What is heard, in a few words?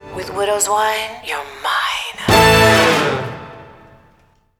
Sting; Zombie Zod - Perksacola - Widowswine